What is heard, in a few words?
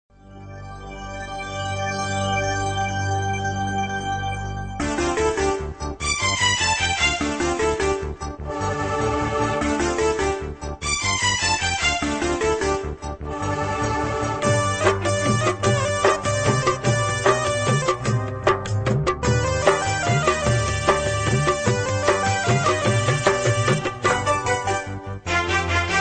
jesus song ringtone, christian gospel ringtones